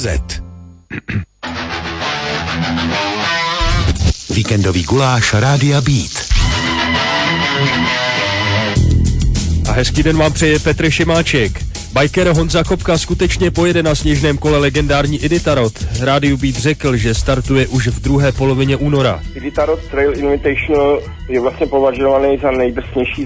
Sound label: Beat Alaska